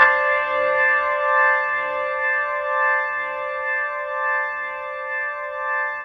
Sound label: Organ Lick; Ambient Grooves; Organs Licks